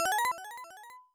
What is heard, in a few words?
Coin; The NewZealand Story; Genesis